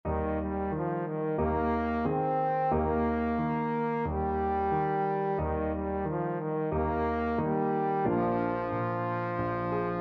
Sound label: cradle trombone